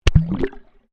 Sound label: Water Microphone Dunk Shallow Submerged